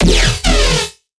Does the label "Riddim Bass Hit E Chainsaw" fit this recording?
Yes